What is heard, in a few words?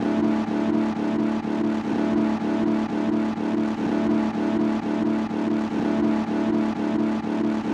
Sampler 124bpm B